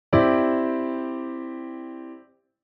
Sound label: dissonance Cadd9